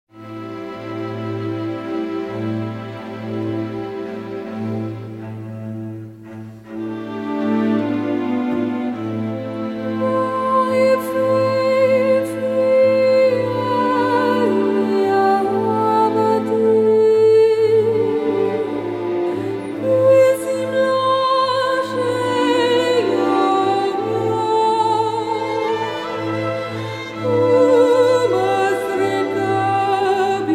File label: Hebrew - Symphonic; Song of Songs